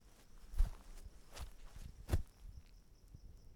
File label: Walk Grass Night